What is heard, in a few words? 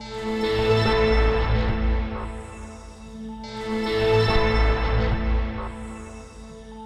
SPACE PAD 140 A; SpaceSounds